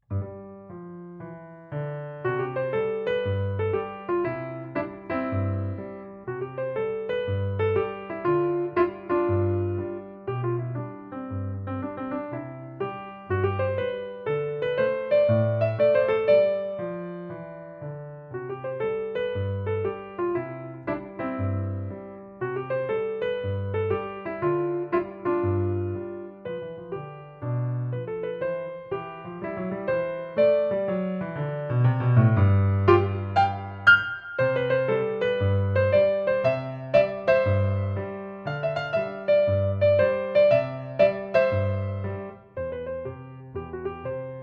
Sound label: piano